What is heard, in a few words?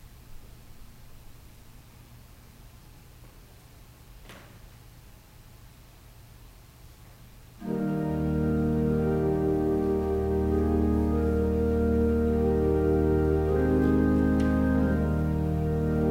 Organ Music; The Desire of the Spiritual Bride; song of solomon 1 2